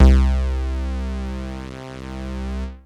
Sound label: Techno leads, hits and riffs